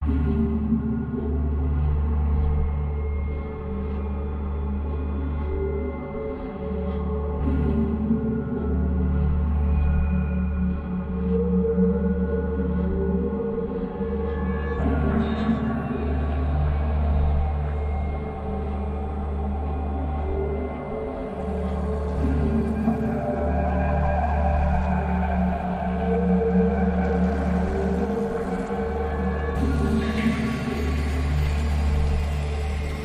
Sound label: Anarchy Drone